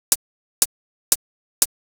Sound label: Hi-Hat Loop, Drums, Dance Beats